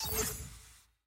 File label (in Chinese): 开始匹配; nba